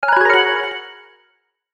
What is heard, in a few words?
Logo Fast Melody Echo; Sonic Logo Sound Effects; Production Element Imaging Element Accent Transition